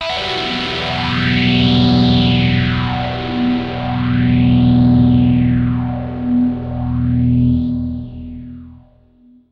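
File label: dirty guitar stereo polyphase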